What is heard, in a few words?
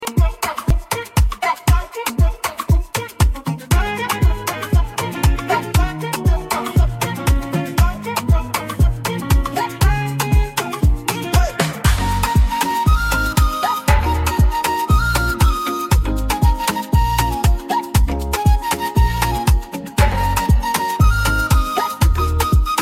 contest SONG instrumental; Glowfux